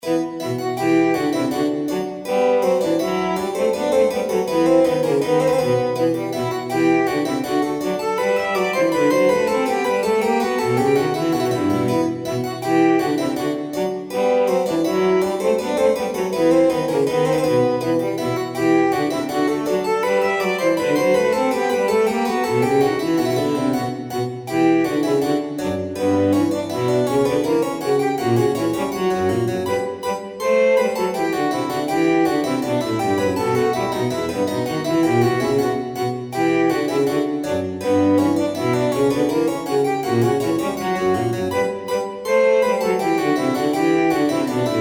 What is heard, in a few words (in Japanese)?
ガヴォット; elemental; light